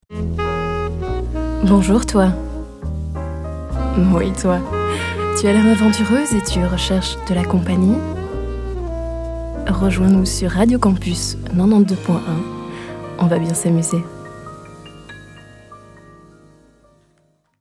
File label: jazz rose session